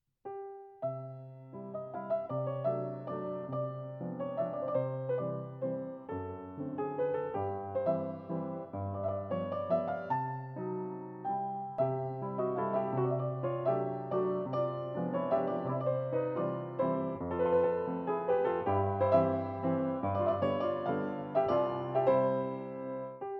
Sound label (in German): C-Dur, Mazurka; Chopin; op 67